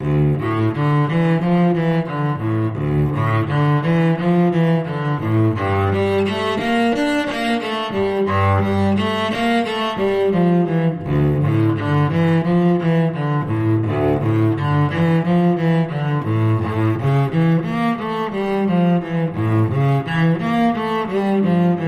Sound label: Cello